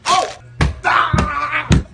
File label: falling down the stairs; meme